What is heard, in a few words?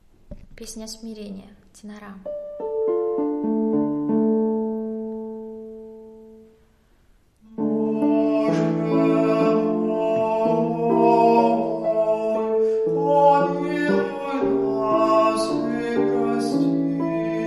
tenor; Pesnja smirenija